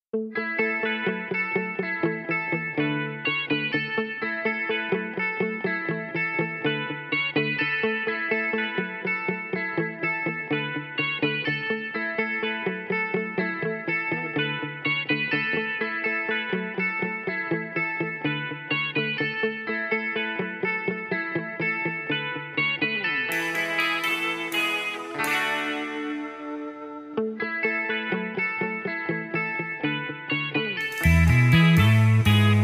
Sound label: (A) No Backing Vocals